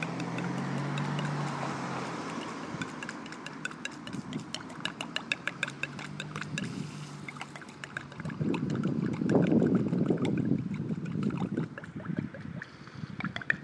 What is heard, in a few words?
leaking pipe; portishead